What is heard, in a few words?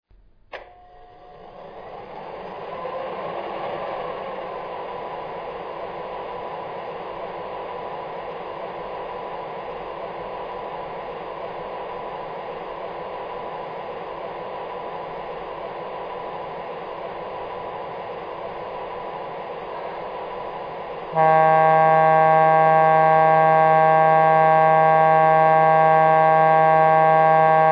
GG1, trains